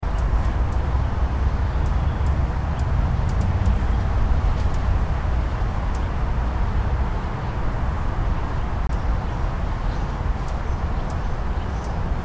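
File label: atmo california mission gardens; ca sjc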